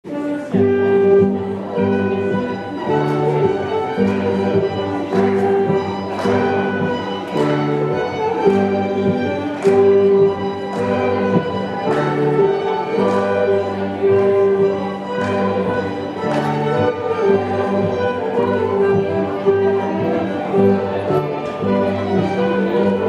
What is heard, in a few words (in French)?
Instrumental; St Jo - Noel 07; Allez criez